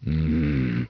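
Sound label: male yum; People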